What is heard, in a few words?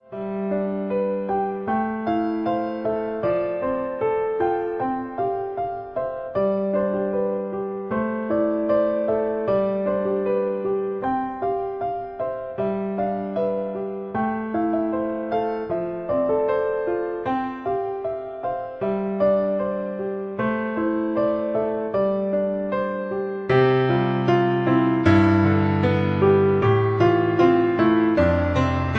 Piano